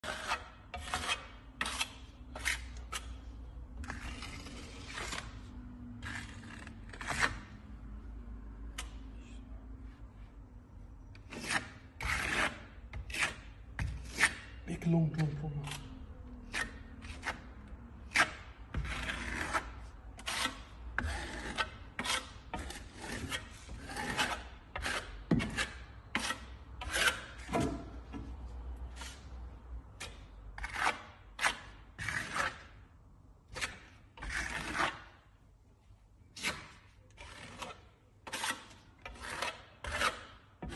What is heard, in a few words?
Masonry trowel; کاردک فلزی